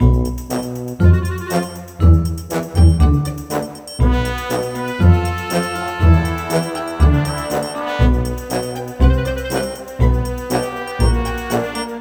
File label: Lazer; Loons The Fight for Fame; Xbox